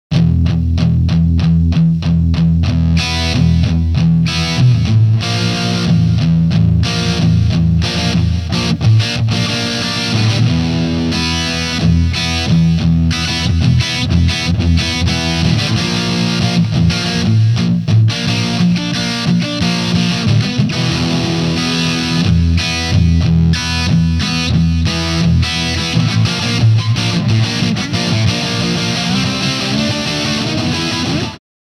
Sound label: intro, Tube Driver, Hiwatt, Eclipse Cab, delay